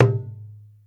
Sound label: WAYANG KULIT G#6; Spectrasonics - Heart of Asia CD2